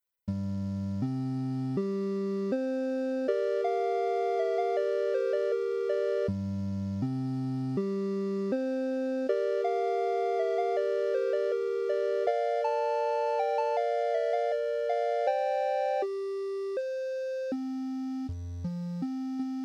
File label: SID JRPG